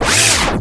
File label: ROCK